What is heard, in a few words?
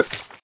Pop